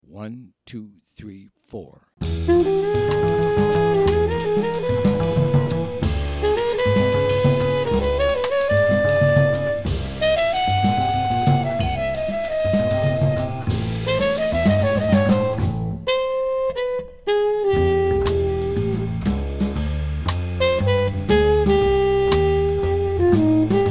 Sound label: instrumental minus piano